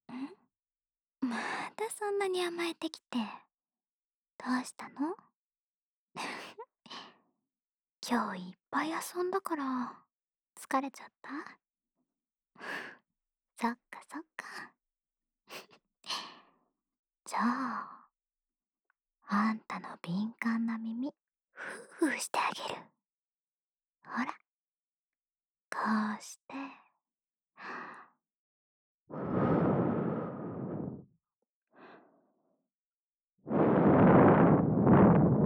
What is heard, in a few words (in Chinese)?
安眠用耳朵吹吹（右耳）, ASMR; 成为猫的ASMR; 吾辈的耳朵是很敏感的！